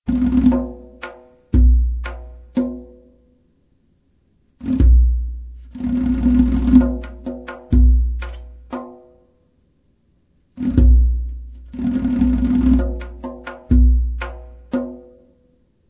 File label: bongo; ambient; music; Source Engine; cstrike